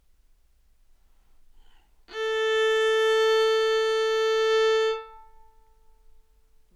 modern viola open a, Anechoic; OPENAIR

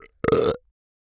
burp; OPENAIR; Anechoic

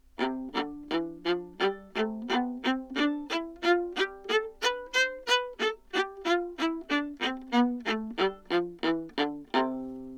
modern viola c major detache, Anechoic; OPENAIR

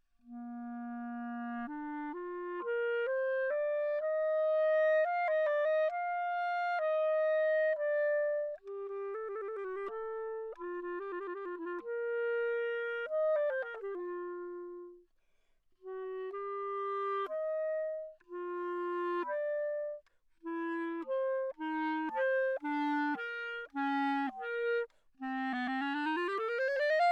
piece, modern clarinet bb, Anechoic; OPENAIR

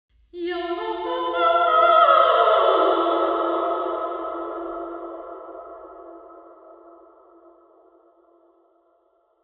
minster omni sing, York Minster

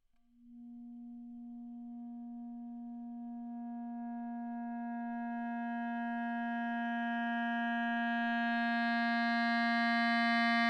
anechoic modern clarinet bb; OPENAIR

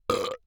burp; OPENAIR; Anechoic

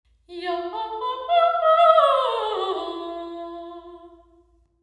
sing, St Margarets church; ncem 5 piece band spatial measurements

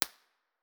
koli snow, koli national park winter; 1 way mono

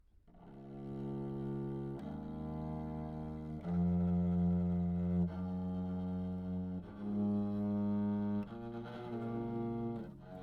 bass viol back mic, Anechoic; OPENAIR

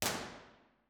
dixon studio theatre; b-format; university york

